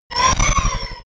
sci-fi laser shot disrupt; krieg-waffen; soundpool

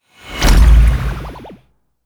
promo pad; whooshes rises

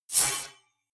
sci-fi telemetry; zaps-buzzes

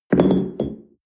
espresso upset tablecloth medium

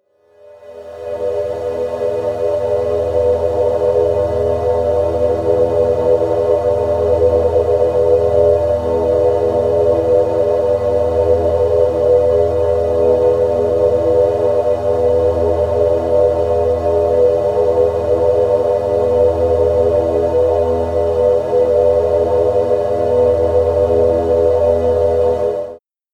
wide air, music elements

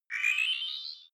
game start; signale

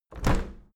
window handle open hard; objekte; fenster

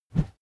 whoosh bamboo slow

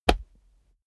combat cloth hit; krieg-waffen; nahkampf; soundpool

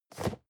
cardboard box medium place slide; objekte

